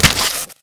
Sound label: NPC Killed